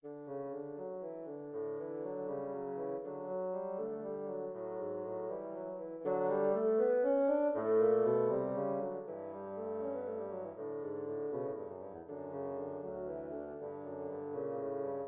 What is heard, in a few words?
study, 2 bsns; J.J. Friedrich Dotzauer